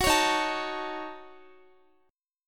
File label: Eb Minor 7th Flat 5th, Guitar; Ebm7b5 strummed